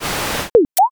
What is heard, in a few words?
gacha roll